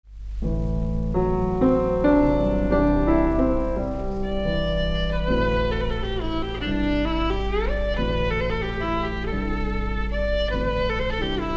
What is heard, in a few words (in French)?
Sonate pour violon et piano Pastorale; MILHAUD